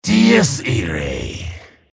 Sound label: hellknight pain ability damage; low health